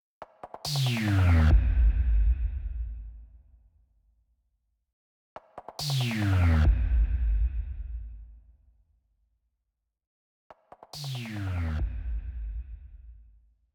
Transition; entwined; ps vita

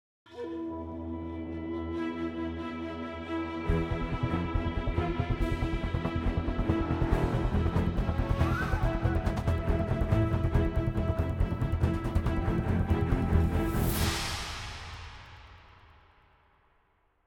Boss Combat Intro

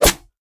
Whistlers Attack spear Side; we are the dwarves gamerip 2016

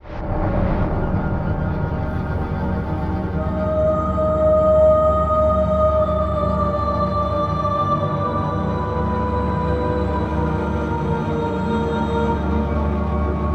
Restaurant Gas Leak; The Darkness 2 gamerip 2012

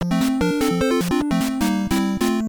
Session Over; FamicomBox

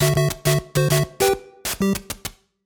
Scene Change BGM

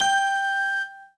Tone; lego my style preschool

